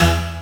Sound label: Synths E; Vengeance Samples